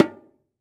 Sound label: Bongo Drum Hit